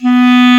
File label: clarinet B 3; East West; Global Sounds